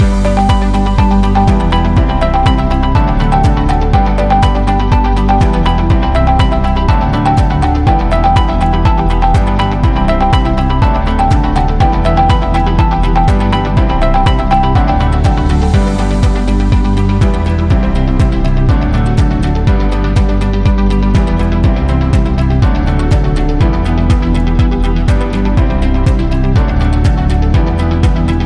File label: Corporate Loop Background; technology